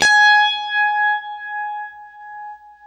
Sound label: UNIVIB G#4, Guitar; East West; Steve Stevens Guitar Samples Collection